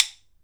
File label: KALAAW, HAWAII PERC